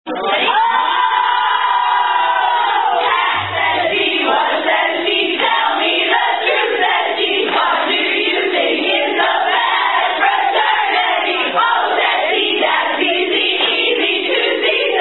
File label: Sorority Song; Oh Pat -- Kappa Kappa Gamma; KKG Sorority Song